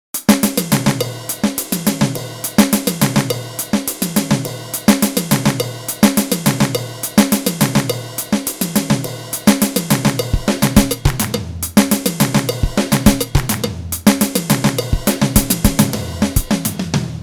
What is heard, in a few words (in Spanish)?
Ritmo Hydrogen